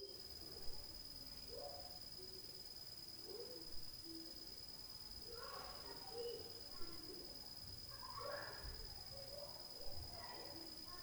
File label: DOG + KIDS + DOOR SLAM; Night Noise